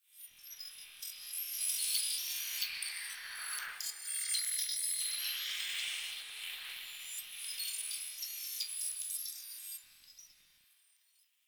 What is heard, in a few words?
Data Texture B; Warframe; Venus Open World; Venus Solaris United